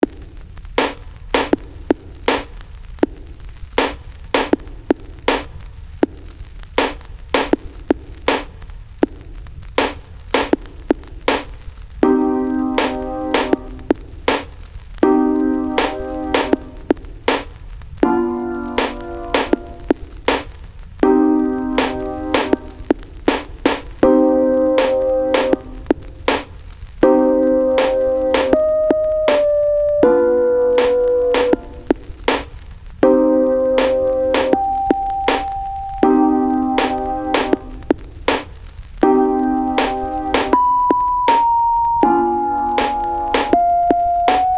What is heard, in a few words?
short music piece